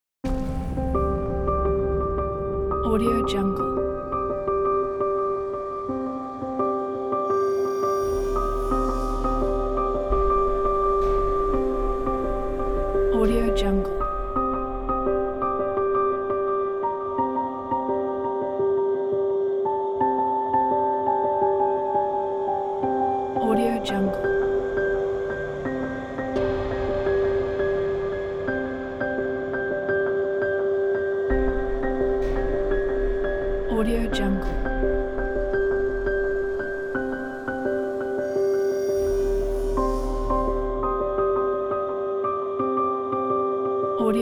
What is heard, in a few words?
this is tense